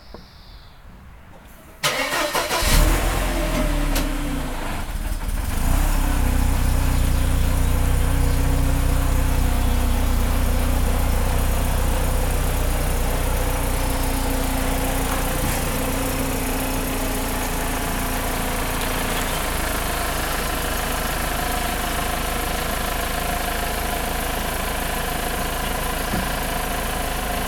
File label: old mercedes c180 from garage onto road; An old Mercedes C180 starting in the garage and making its way onto a quiet road. Midsummer's eve, around 11am.